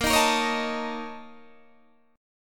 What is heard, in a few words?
A sharp Minor Major 7th Double Flat 5th A sharp Guitar DADGAD